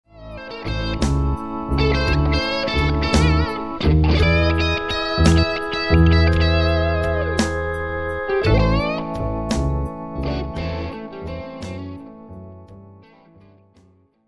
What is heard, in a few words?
e7 turnaround